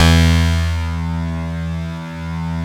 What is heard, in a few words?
PERC BAS